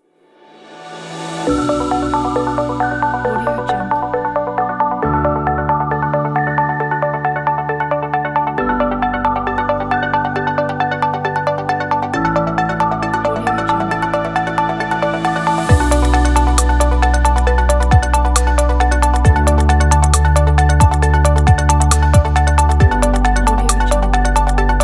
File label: minimal technology inspiration; fugu-vibes